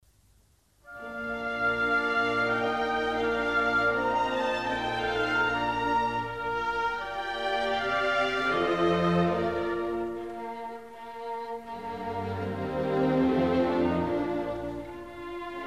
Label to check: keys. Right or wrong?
Wrong